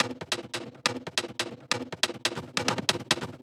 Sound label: 140 Drums Grove; Touch Loops; AmbientDubMini